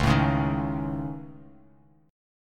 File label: Db Suspended 2nd Sharp 5th Db Bass Standard; strummed; Dbsus2#5; JGuitar